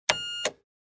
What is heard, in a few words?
Cash Register Button Press long beep